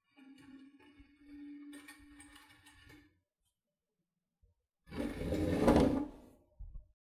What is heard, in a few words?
Scraping A Wooden Chair Along The Ground Slowly And Quickly